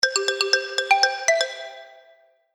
Happy Motif App Music; Musical

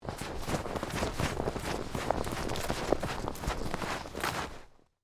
Snow Run Deep, Footsteps, Through, Forest, Short; Footstep Sound Effects; BlastWave

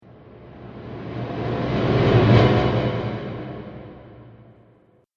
Flashback Transition Whoosh

